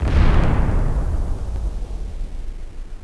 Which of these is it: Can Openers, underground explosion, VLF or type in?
underground explosion